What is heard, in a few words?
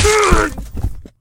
die; Bascileus